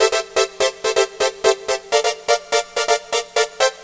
Pop Dance Comp; Sonic Foundry; Crimson Blue and Fabulous Horncraft 4 RnB; Loop Samples; NYDancePRO

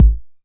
909 BD; Goldbaby Tape808 & Tape909; The Tape 909

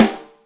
Brass Rim, Yamaha DTXpress Acoustic Snare

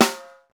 SNR, Roland; Real Snrs

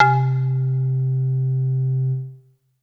mirimba, synth

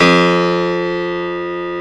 PF, GRAND PIANO; AKAI S6000 CD-ROM - Volume 1

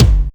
BD, Dance Mega Drums, BD HOU; Best Service

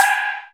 FX CYMBAL; E-MU Producer Series Vol. 2; More Studio Essentials; Composer; Technopourri

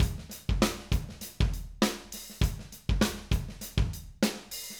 Acoustic Live Drum

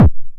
909 BD Tape Sat

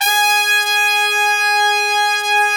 G#3, Section Horns

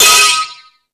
Rap Session, E-MU Pro-Cussion

